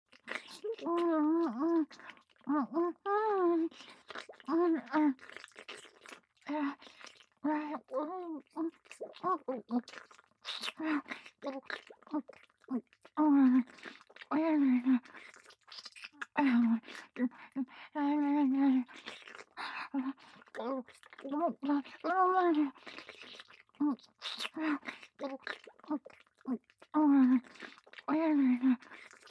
正面近い・ディープキス・激しめ; ミーシャ

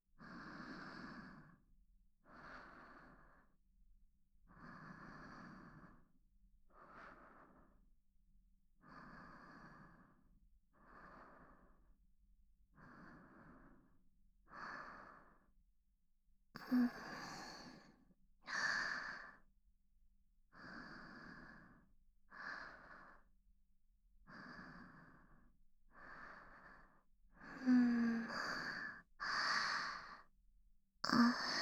寝息; SEあり

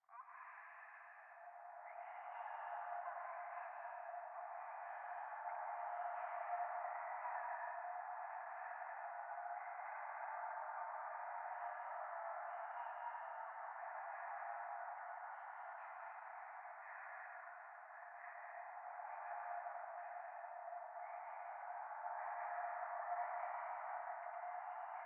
右耳密着処女喘ぎ 車の中

